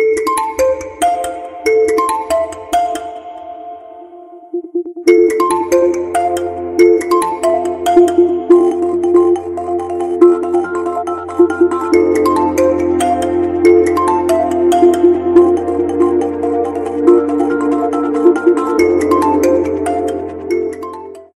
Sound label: Oppo F19 Pro Ringtone